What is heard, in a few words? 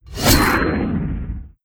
Sword